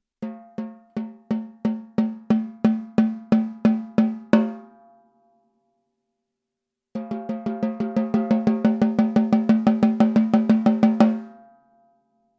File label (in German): 14x8 Mahagoni Snare Recordings Hoch